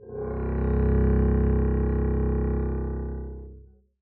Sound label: arco c1 f up, double bass